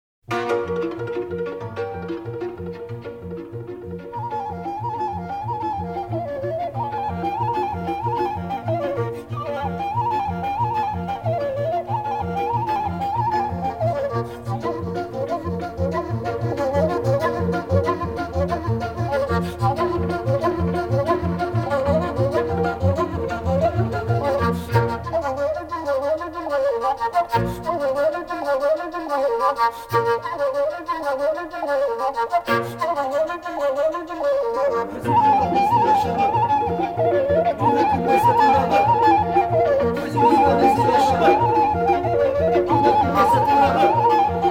taragot, folk orchestra, horo, rumanian folk music